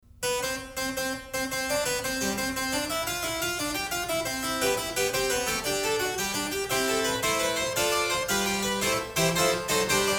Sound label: Gigue, harp; Buxtehude